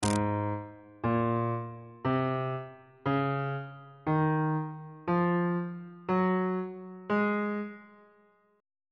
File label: a flat mixolydian mode note positions on bass clef